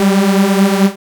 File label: BLAST G2, dubstep; Jack Sparrow; Deep Dubstep Progressions